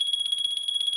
count Up Loop; secrets of christmas

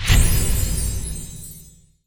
gLockItLand; narcos

